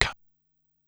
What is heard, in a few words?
"Kaahh", drum kit; Wave 455 - Drum Kit